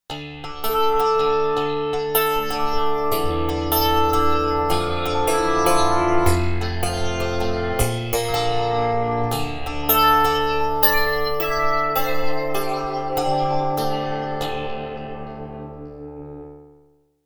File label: Strangge, synth